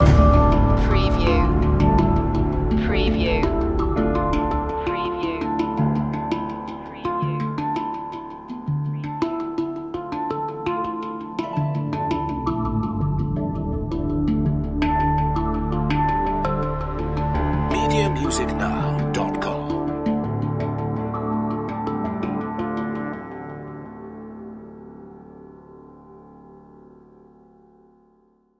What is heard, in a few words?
modern no electronic drums